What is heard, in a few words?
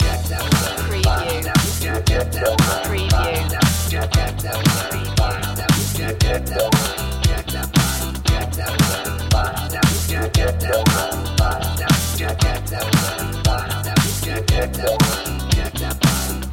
daft funk loop